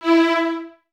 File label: Violins Detache E 4; Miroslav Vitous; String Ensembles